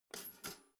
toaster pull up; maschinen

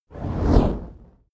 fire whoosh torch med flange

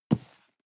cardboard box medium grab slide hard; objekte

